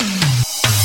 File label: Electro Fills